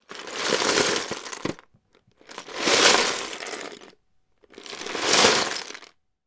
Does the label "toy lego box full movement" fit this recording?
Yes